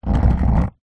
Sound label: household chair sofa drag concrete wooden legs scrape